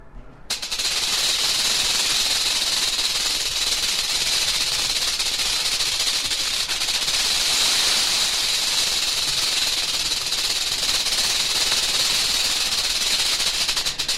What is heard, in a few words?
rattlesnake rattle; animals